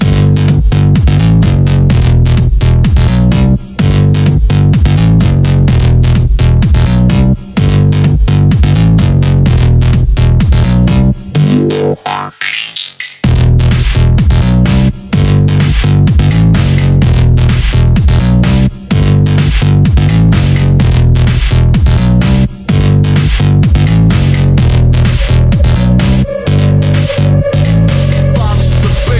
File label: bass